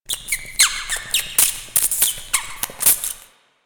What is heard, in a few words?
Amb Misc Subway Mice; Warframe; NineteenNinetyNine